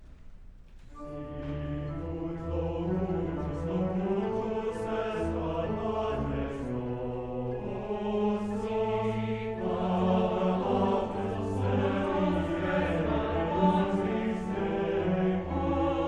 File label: Concert Choir; Bach; Magnificat; Sicut locutus est; MIT Concert Choir